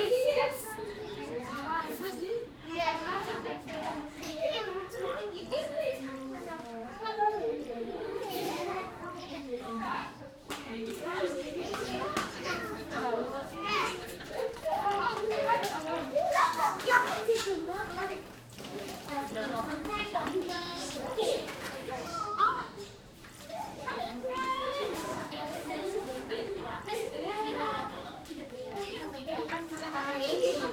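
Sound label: children audience in theatre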